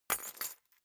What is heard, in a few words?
key ring large drop parquet soft; objekte; schluessel